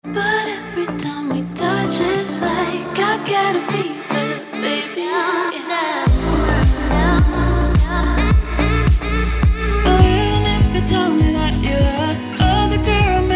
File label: Lounge